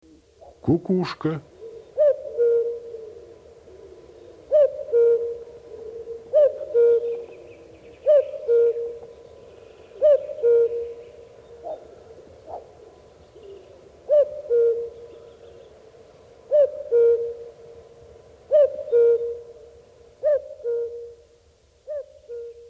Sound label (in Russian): kuku, голоса птиц; Кукушка